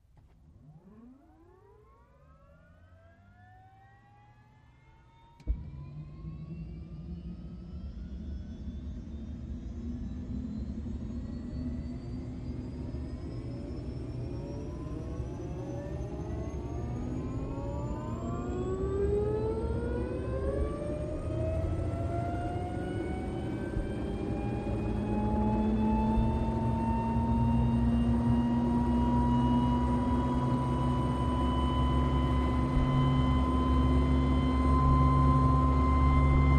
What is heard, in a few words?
OH6 Loach Interior, little bird